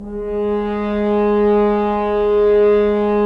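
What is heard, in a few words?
Solo French Horn, Brass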